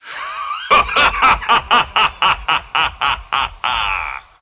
laugh

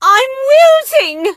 death; 罗莎

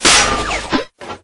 shield; dark prince

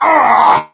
bull hurt vo; 星际公牛; 荣誉之路

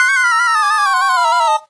die

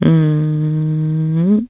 trader hum; 商人杰恩（七日杀）

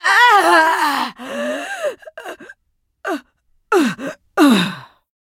death

